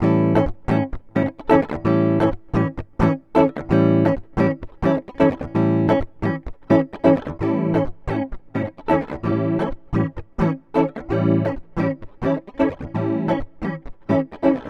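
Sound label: double tracking guitar with vocal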